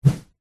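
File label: large stick bamboo swing swipe whoosh air; zapsplat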